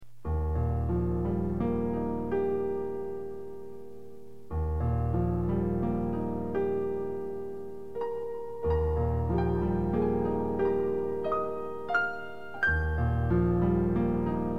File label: In the Garden Playing Piano